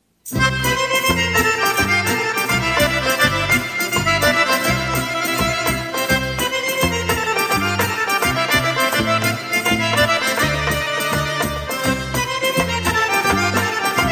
Pajdushko oro, Bulgarie

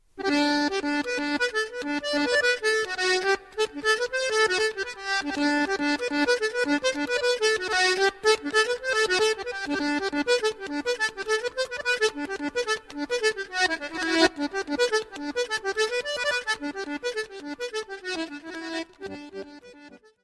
Gigue, Polka du Bas Berry, France

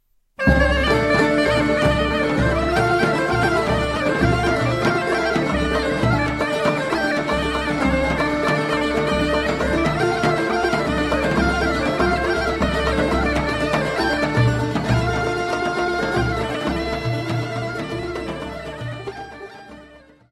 Oro - Macedoine

